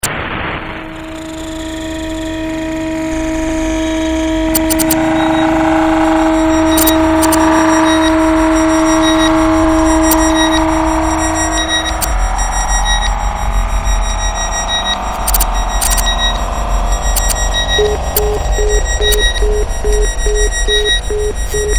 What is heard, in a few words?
JINGLES dynamo